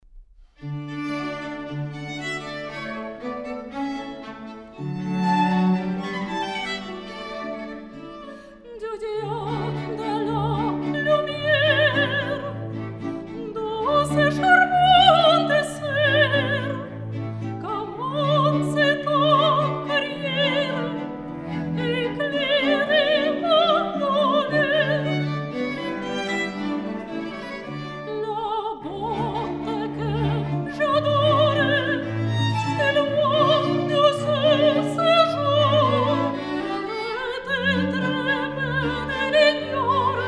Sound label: music